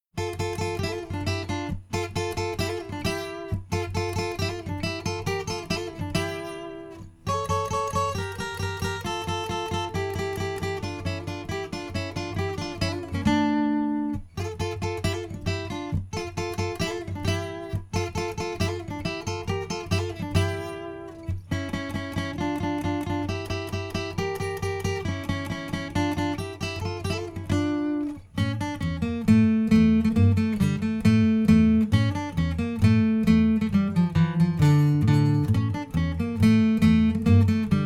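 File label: mediterranean guitar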